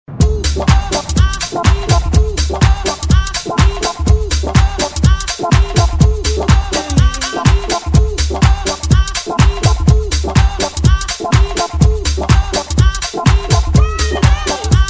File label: beats